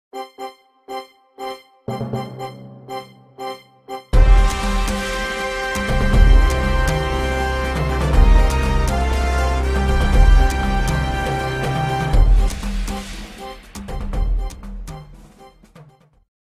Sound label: News Jingle